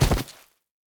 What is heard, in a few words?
Land Sand; Opsive; UltimateCharacterController